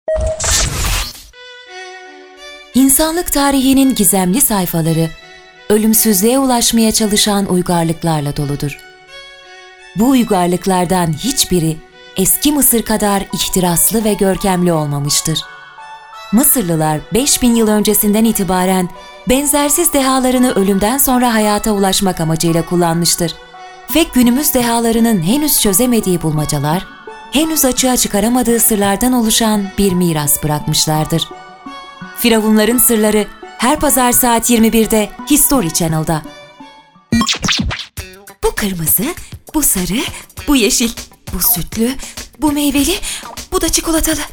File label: jingle